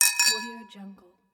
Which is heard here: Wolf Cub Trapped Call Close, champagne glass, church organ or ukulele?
champagne glass